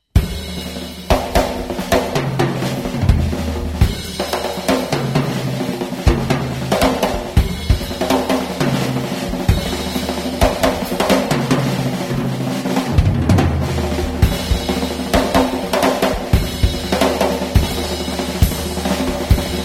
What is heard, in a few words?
Drum Thang